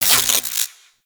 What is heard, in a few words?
Mag Spark; Archwing Intro; Cinematics